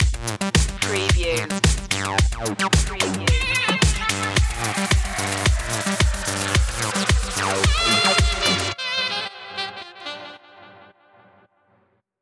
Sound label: french punk stinger